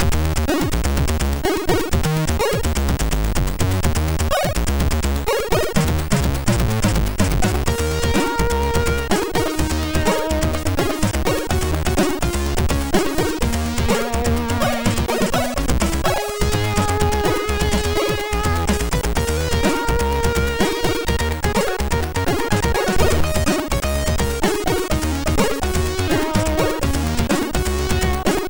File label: Background Noise, MOS6581R3